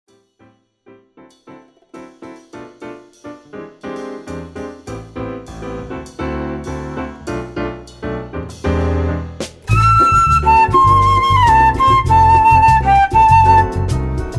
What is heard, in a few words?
cual es, south america sensual